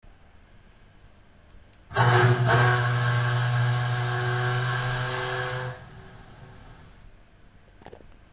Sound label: OWLER